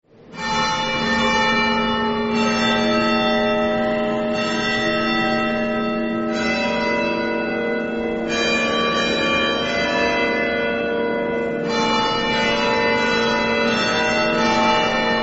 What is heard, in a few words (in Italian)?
campane